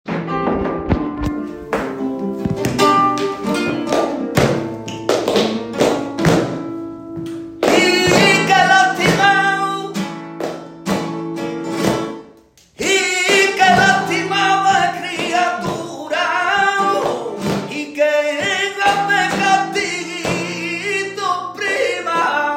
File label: letra solea, flamenco